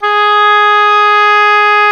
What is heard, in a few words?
OBOE, mf f; Roland L-CDX-03 Disk 1; Oboe 2&3